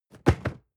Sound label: cardboard box medium fall low hard; objekte